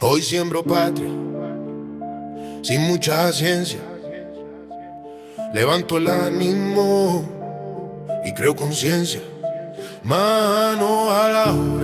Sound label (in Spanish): rap